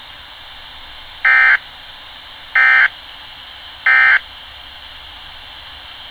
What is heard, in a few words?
Alarm; Envcan